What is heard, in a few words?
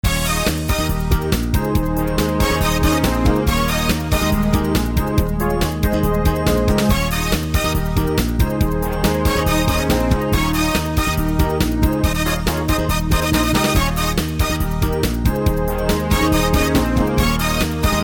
techno